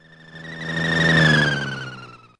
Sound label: Space Flyby